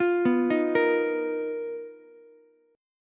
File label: B Major 7th Flat 5th B down Ukulele; BM7b5; arpeggiated; JGuitar